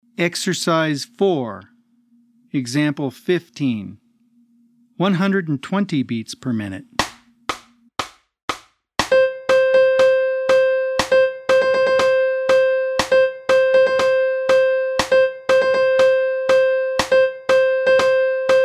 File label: 120 BPM